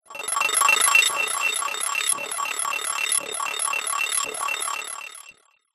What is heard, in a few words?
science fiction data stream